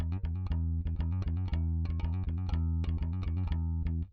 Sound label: bass